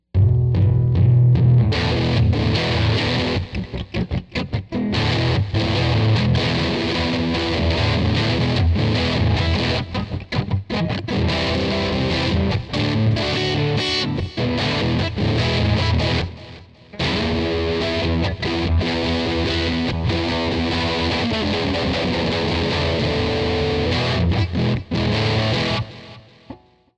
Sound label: electric